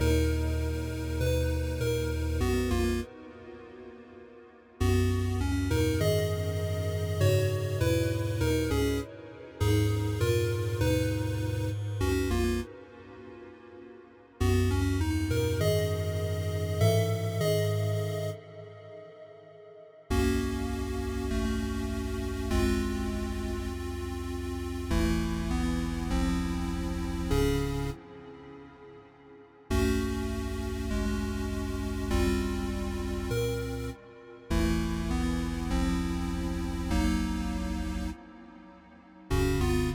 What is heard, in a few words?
8-bit dungeon loop